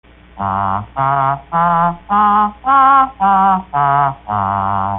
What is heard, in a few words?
Singing Scale, voder